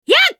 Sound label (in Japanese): 攻撃短; ダリア